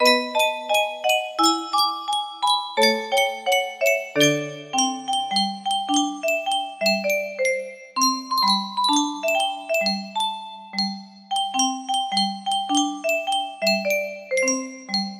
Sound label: music box melody